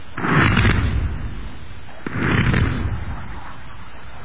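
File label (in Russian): взрыв снарядов вдалеке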